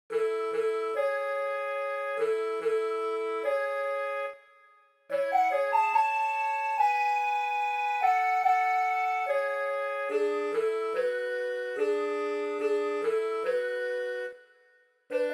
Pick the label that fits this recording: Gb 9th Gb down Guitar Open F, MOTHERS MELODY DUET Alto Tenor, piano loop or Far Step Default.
MOTHERS MELODY DUET Alto Tenor